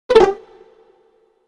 Deactivate Cheat; Banjo-Tooie

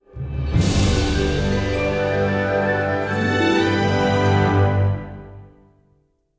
Stinger Win Outro; Candy Crush Soda

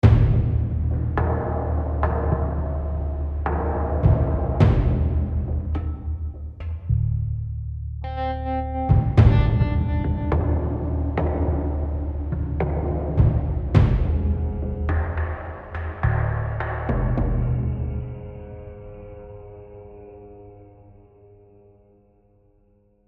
Tension; AB Tension 4 High Block 1

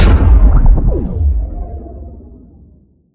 Sciweap Shot Large Gun Carbon Injector

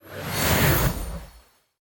vanish; Scrat

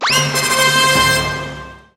(Sfx) Combo

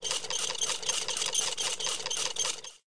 Wheel

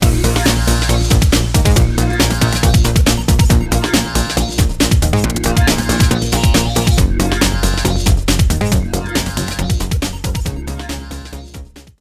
Stinger; DFH Stadium

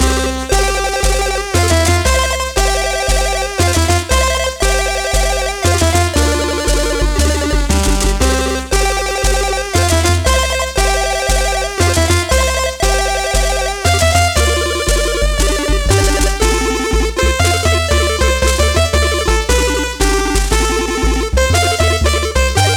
8bit Star Wars; lego star wars the force awakens 2016 ps vita

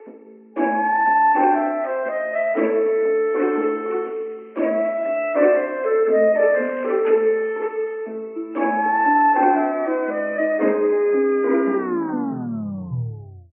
Music Record Funky

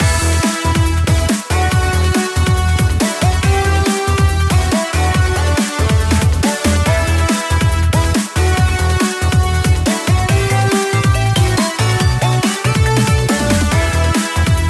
Mainmenu Loop; action henk

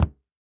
footsteps work boots wood stomp

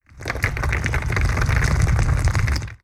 Landslide; lego ninjago rise of the snakes

